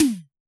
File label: hip hop kit hi tom